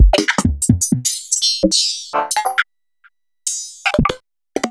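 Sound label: Roland MV8800 drum HIPTRONIC KIT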